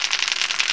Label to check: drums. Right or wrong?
Wrong